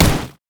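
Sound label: sad mummy basic attack; LoL; amumu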